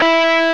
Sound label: Dist Guit (E2); X-Wing; X-Wing Patches